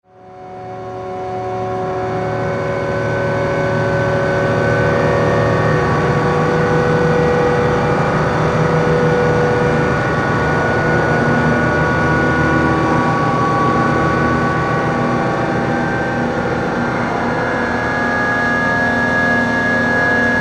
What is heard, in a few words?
SIREN